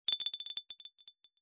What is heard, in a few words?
rolling ball; Fire Weapons Sound Effects